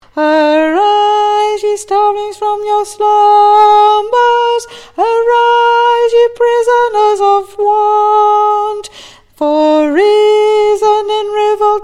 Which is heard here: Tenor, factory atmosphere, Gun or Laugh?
Tenor